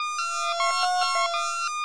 129 sad strange melody; SYNTH AND ELECTRONIC LOOPS

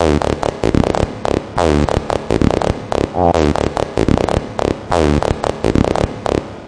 tweaking hard verb bass, bass loops

